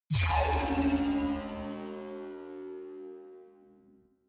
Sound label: energy field vanish; energie